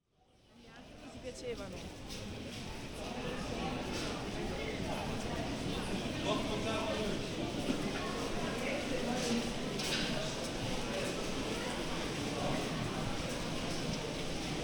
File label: binaural